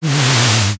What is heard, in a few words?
chair move small; daily life